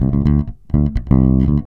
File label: REAL BASS, BASSLICKS; Ueberschall; Jam Box